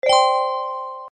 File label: win